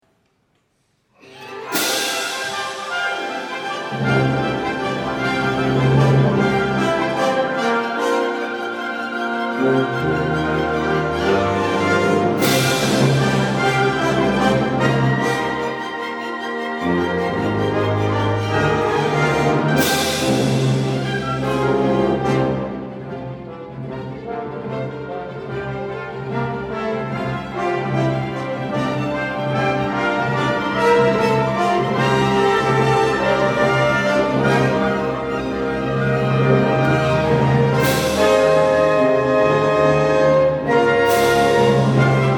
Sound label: Fanfare